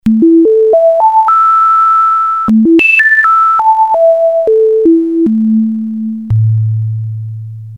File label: sine wave tone; Red Sound Darkstar